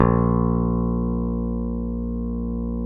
A#1, Bass; Sampleheads; Will Lee Bass Library

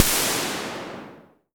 EFEX CY, DRUM

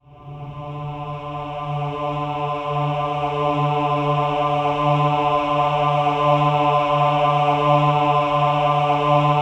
OH-AH D3, choir; Spectrasonics; Symphony of Voices

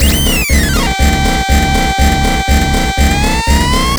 SWEP MACH, LOOPS

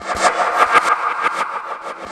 FX REVERSE

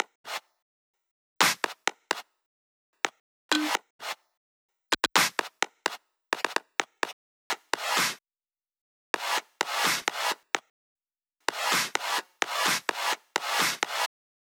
Loop 128BPM Perc; VEC5 Drumloops; VEC5 Multi Loops 128BPM; Loop Elements

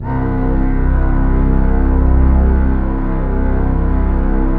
huge stack; Greytsounds; Scott Peers Sound Engineering

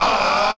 VOCALS; Ueberschall; Jam Box

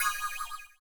CYM, Ethno Techno; ILIO - Ethno Techno

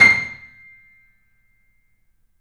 The Piano; InVision Interactive - The Piano

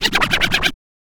scratch kit, vinyl

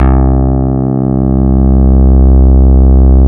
Mini bass C2, Mini Bassics Vintage Keyboards; Big Fish Audio - First Call Vintage Keyboards

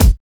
DISTORT KIK, HIP-HOP; Drums In Your FACE!

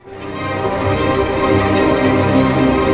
ARP C, STR ARP MIN; Sound On Sound - Peter Siedleczek's Orchestra

